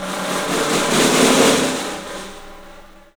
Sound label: PICSNF, 3D Drums, PICOLO FLAM; 3D Sound